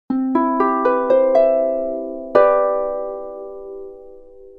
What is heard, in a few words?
Jingle